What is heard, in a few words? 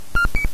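handshake, Ademco ContactID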